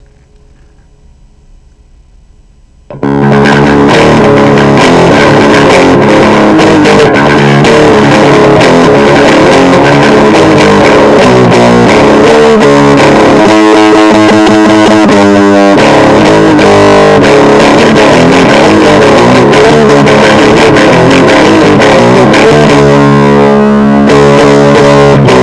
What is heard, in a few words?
metal